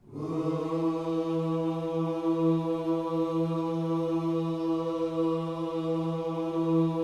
WHOO E3, gospel; Spectrasonics Vocal Planet

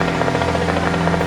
Bad Stats, FX; M8

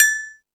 Dance 2000; E-MU Pro-Cussion

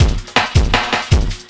LOOP L, Drum & Bass 160 BPM

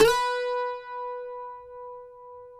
CUATRO B 4, Guitars; Spectrasonics; Hans Zimmer Guitars VOL-2

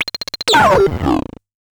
Bent Talking Teacher, Circuit Bent

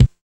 BD PSS50, digital; 90 s Sample CDs; Sound & Vision - Gigapack I CD 1 (Roland)

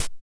HH, HH Synth

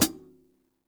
hihat - close; JazzFunkKit

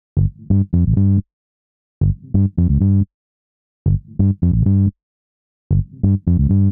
Bass Loop 130; Equipped Music; Loungin House

